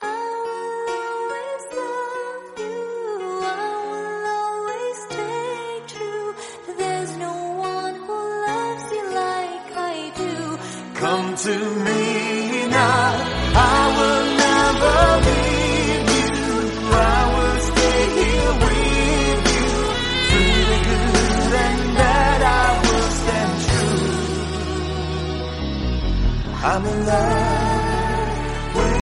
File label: soft english song tone ringtone; samsung ringtones